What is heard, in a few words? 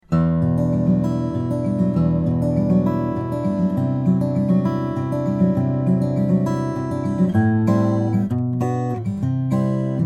simpson ga c, steel string guitars, flattop